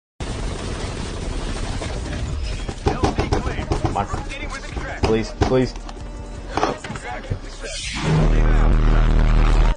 man screams into the 5th dimension

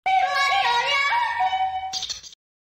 yodel buh

